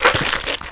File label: claw strike